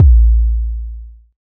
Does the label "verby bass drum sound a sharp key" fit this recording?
Yes